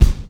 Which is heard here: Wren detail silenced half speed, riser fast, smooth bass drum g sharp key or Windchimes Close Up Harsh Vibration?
smooth bass drum g sharp key